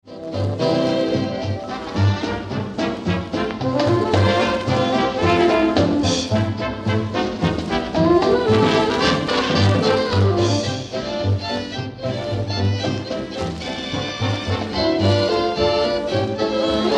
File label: Instrumental Medley